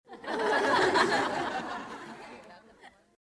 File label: audience laughter